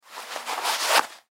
Gloves Leather Off, Gloves Leather Work Gloves Take Off; Dressing; Human; foley; Blastwave